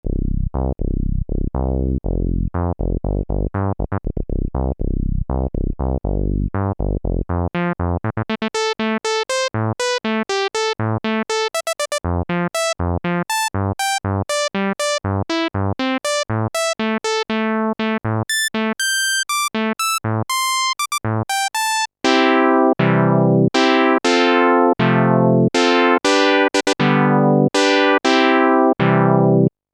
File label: alesis micron filter lpf jp 4pole q075